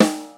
Old School Original Steel Snare Drum D Key

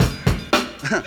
89 bpm hq drum beat f sharp key

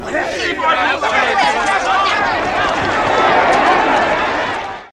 SPECTATORS MURMURING

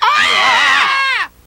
group screaming

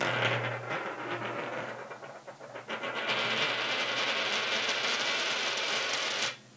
coin on the table

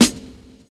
subtle reverb acoustic snare sample a sharp key

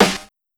small reverb snare drum sample f sharp key; snare drum one shots

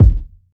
boom kick drum d key

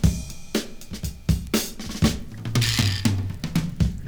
119 bpm drum loop f key

